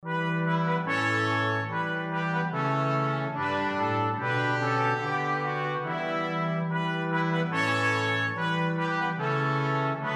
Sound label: Brass Quintet; Liedboek; Groen ontluikt de aarde